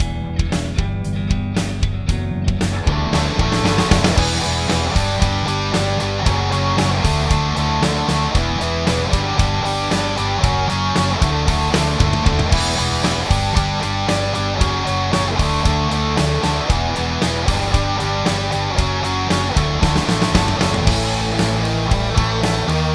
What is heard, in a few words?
scoop and hi hats